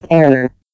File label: error